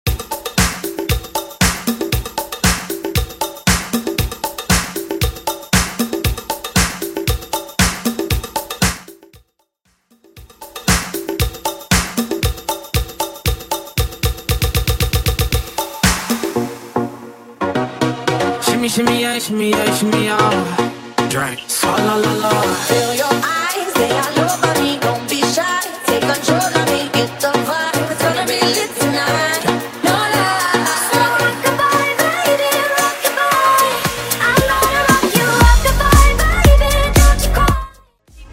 Pop Music Megamix Beat In Mix Mash Up No Outro 116